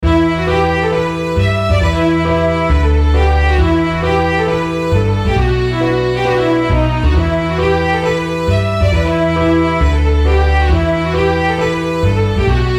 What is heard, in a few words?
strings